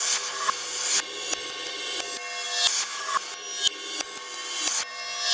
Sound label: Cymbol Particle; Particles